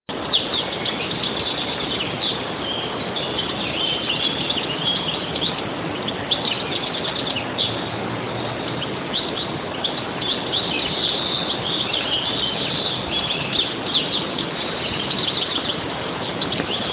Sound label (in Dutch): onbekende vogel